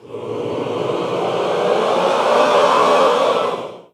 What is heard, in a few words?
Bowling Crowd